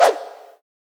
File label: whoosh